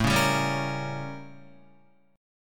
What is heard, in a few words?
A 7th Flat 9th A, Guitar; strummed